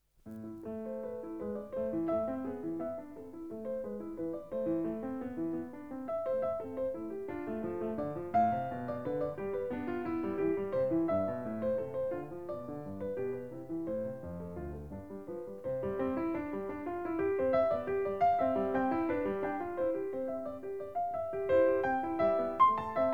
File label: Bach Two Part Invention in A Minor; No. 13; BWV 784